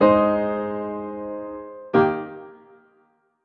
Piano

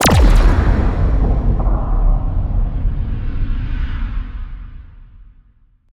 Implode

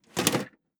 SFX case Attach Metal; PC Building Simulator

